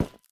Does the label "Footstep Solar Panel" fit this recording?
Yes